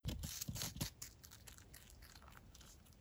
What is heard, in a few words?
munch; inthewalls